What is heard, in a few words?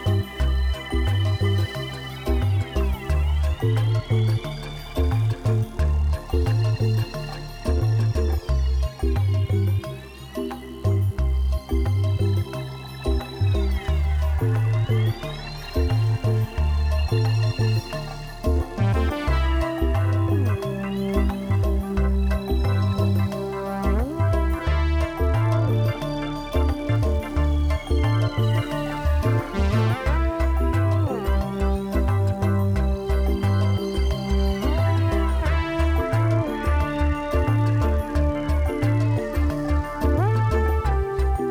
space jazz